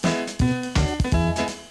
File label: Short Upbeat; Mac Concentration 1.8